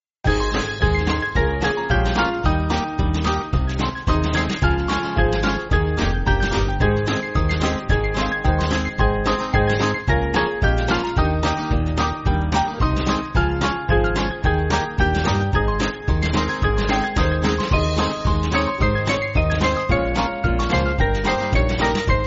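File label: Band, Free Music Backing Files for Churches; Ding Dongs Merrily; Branle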